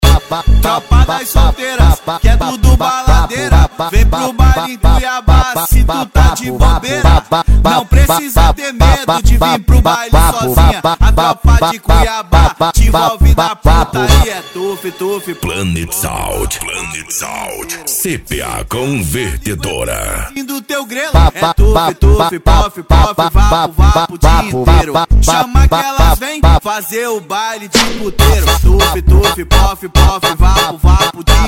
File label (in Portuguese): funk ritmado; cuiaba mt